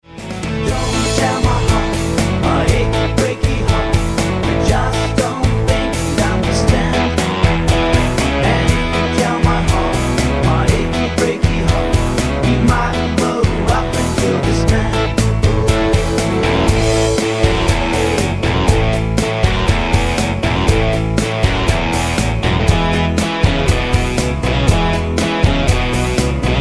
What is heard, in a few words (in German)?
mit Chor